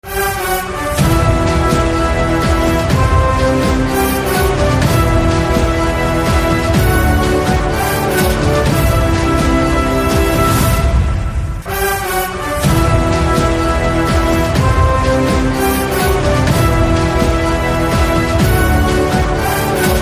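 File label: background music Telugu Ringtone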